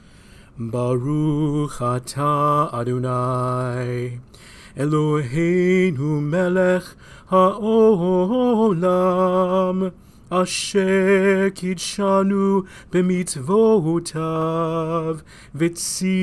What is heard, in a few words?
Candle Blessing